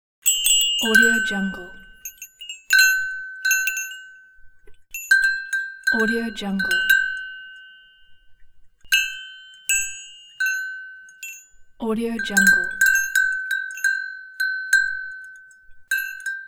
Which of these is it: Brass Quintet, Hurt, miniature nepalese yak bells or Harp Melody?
miniature nepalese yak bells